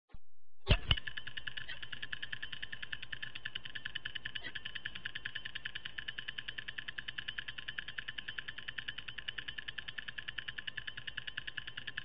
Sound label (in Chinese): 秒表